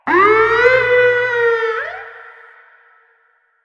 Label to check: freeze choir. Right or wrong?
Wrong